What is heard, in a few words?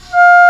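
English Horn p F2; Digital Sound Factory Virtuoso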